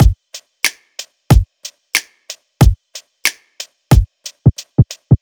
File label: beat; USB Soundscan vol.47; AKAI; Hip-Hop & R&B